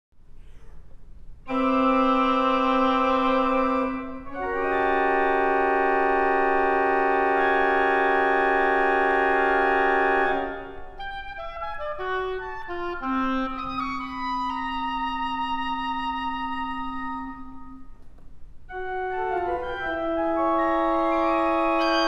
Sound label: Variations for Oboe and Organ Prelude; Daniel Pinkham